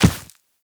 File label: Operator Run Dirt, Footsteps; Warframe